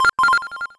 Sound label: COLLECT MEGA; impact